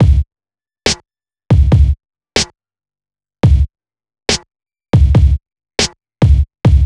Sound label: Hip Hop Beat, Drums